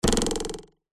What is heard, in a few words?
cartoon twang metal ruler on plastic; zapsplat